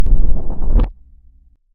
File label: slowmo out